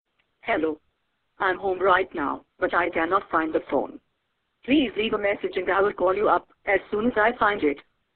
lost phone; ringtones

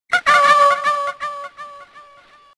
echo notification

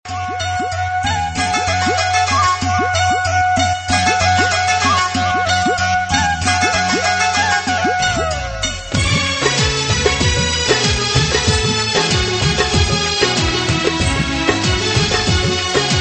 sindhi tone

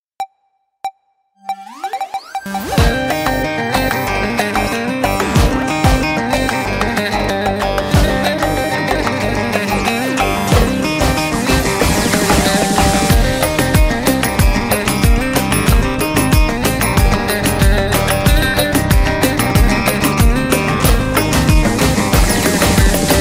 Ankara Baglama

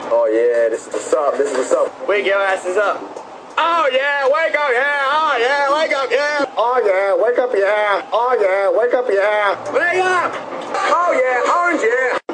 wake up yeaaaaaaa, hip hop ringtones

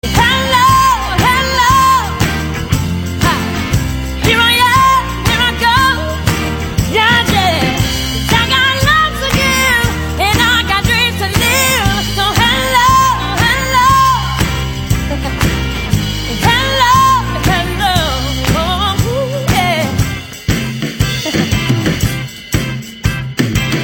hello ringtone, hip hop

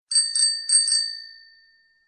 Bell

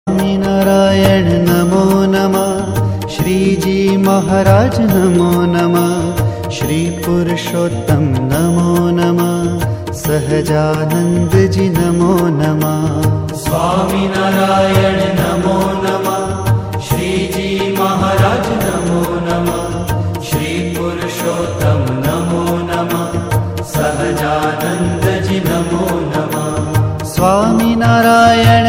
swaminarayan tone; ringtones

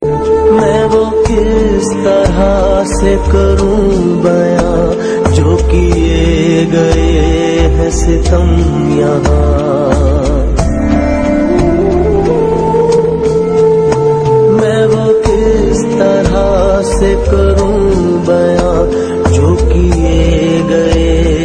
song

